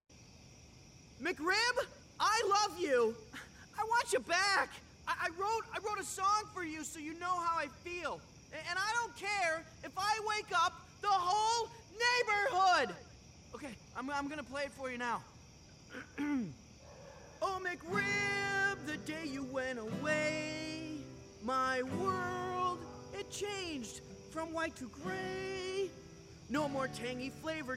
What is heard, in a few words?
Crooner